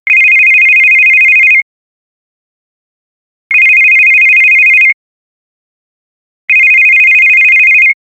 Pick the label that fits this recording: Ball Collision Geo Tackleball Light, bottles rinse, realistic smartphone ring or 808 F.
realistic smartphone ring